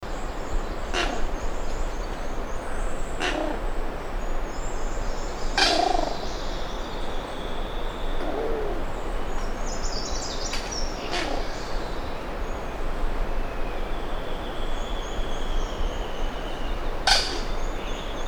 Blue coua; پرنده کوآی آبی

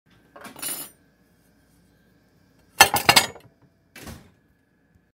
plate

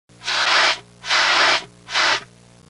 Fire Extinguisher

